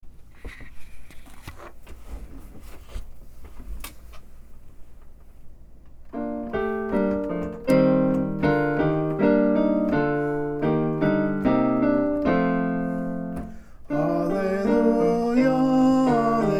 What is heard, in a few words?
Alleluia, Funeral Music; Gospel Acclamation